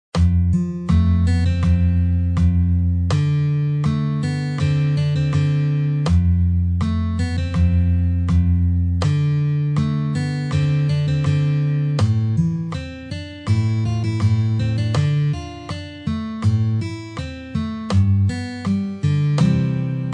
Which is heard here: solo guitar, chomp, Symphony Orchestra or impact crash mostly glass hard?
solo guitar